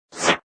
Basic Attack; LEGO Ninjago Spinjitzu Smash

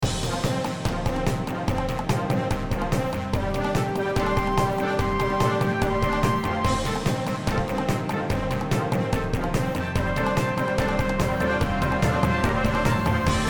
Pet Arena Battle Music

